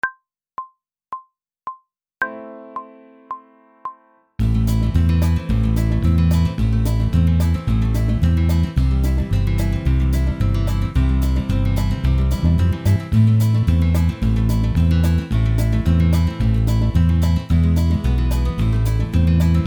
Technical Work Riffs A minor backing track, pop vocals; Grade 2